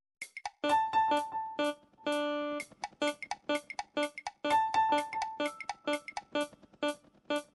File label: Elevator, midi